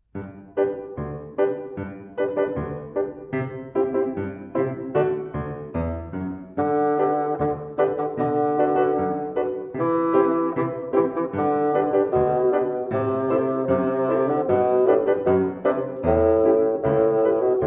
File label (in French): fagott march bass